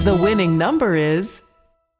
win Sound; europeanroulette3

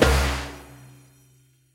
spingle Drum End; wild bazaar

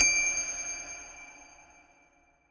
count Up End; wild bazaar

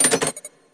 button paytable; eggomatic

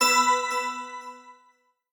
Win Count Up End Snd; starburstxxxtremeusa

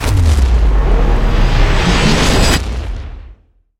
scatter Near Win

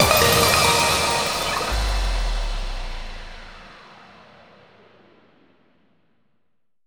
intro Free Spin; jewel fruits

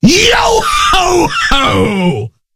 scatter Pirate; rage of the seas

